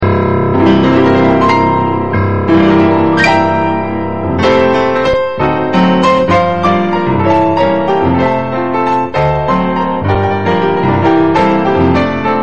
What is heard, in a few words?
Christmas Waltz